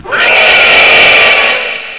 Gorda Muere; Grounch

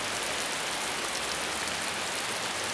lluvia; Revista CD Expert 42; Grounch

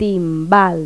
TIMBAL; Pipo-Aprende musica con Pipo

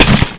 golpe grava; Blade of Darkness

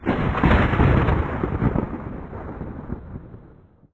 Trueno; Dinamic Multimedia 2000

